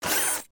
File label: Overbuild Fidget; emotes